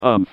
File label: pain sharp; John Madden